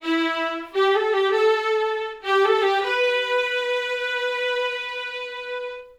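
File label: violins DnB Em 160bpm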